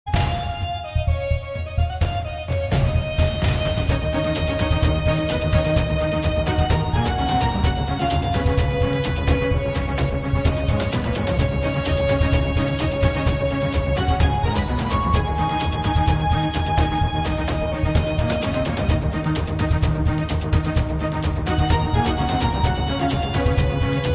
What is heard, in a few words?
orchestra version; Phalanx